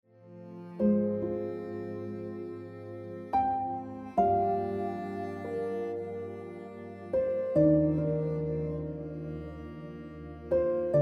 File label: Jade Ringtone Piano Music